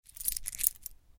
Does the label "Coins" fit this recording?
Yes